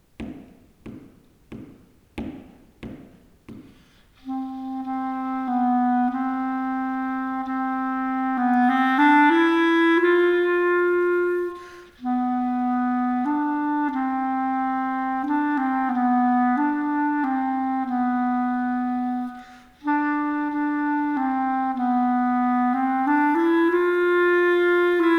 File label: wonderland waltz, Skilful Duets